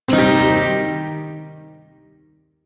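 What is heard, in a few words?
Shawzin Pentatonic Minor Chord; Warframe; Duviri Shawzin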